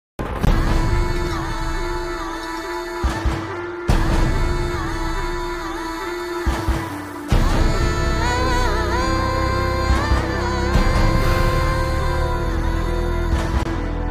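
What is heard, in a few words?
mass background music ringtone; dasara; nani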